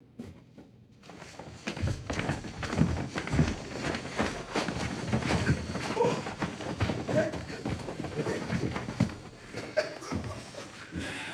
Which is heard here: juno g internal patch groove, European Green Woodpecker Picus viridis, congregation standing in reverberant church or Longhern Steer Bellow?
congregation standing in reverberant church